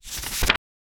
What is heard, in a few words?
8 bit page turning